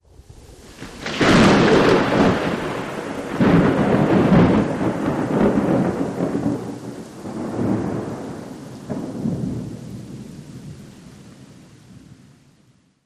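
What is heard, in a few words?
Thunder Crack Rolling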